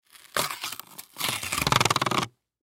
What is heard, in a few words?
Plastic Wrap Open, Plastic Wrap Open Box; Household; Sound Effects:Materials